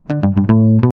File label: very fast arpeggio g a, bass guitar